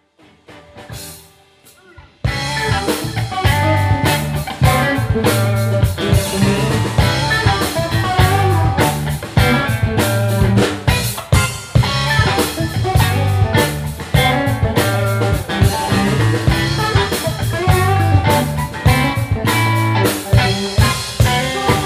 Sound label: Funk Samba